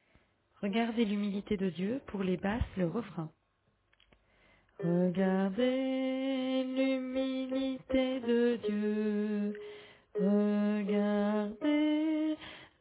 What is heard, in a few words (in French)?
Basse